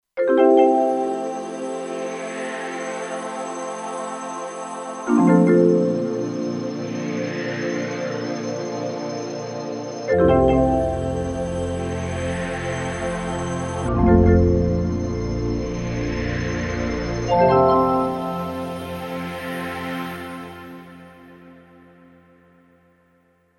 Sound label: Multi Chords, Kurzweil K2500X